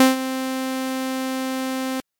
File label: decay; Psychoakustische Grundlagen der Musik